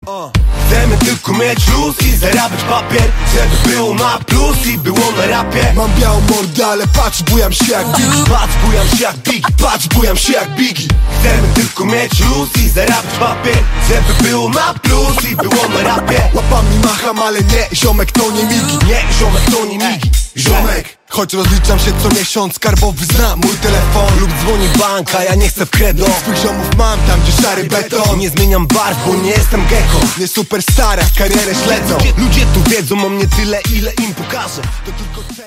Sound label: 91 bpm